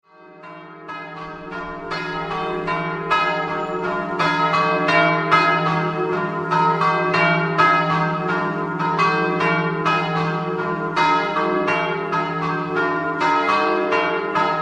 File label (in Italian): RIVAROTTA DI TEOR, campane